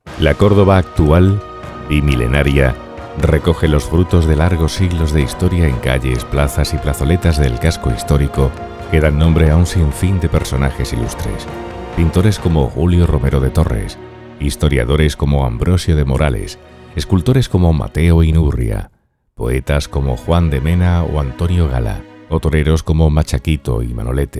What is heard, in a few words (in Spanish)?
Ruta con musica